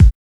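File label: BD, bass drum; X-Static Goldmine 1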